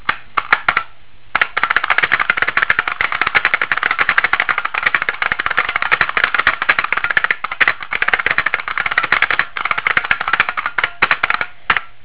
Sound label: typing